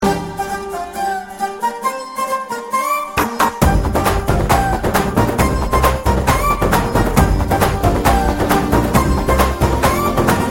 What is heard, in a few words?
Flute Dhol